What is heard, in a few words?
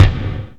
SDX GATE KIC; Greytsounds VOL-1; SDX KICKS